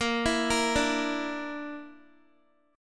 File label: A sharp Major Flat 5th, Guitar; arpeggiated; chordshape